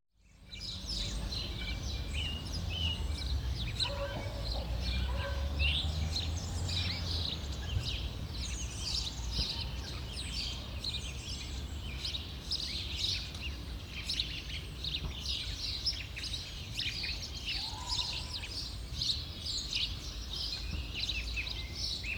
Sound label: Birds winter; Birds, Insects & Animals